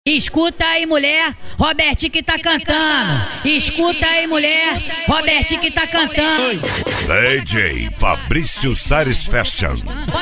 sound car

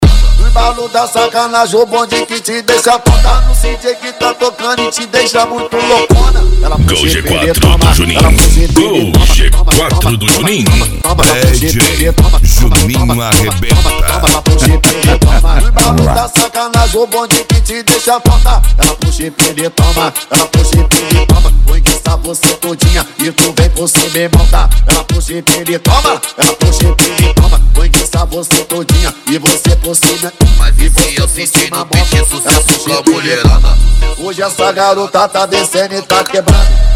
cd funk automotivo

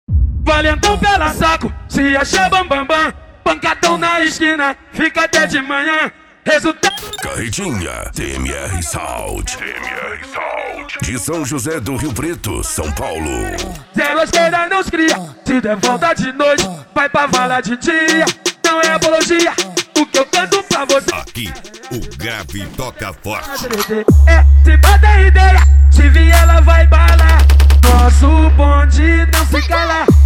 esp insane sound

